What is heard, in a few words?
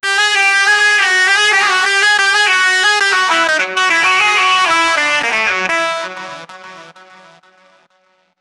arabic flute; ethnic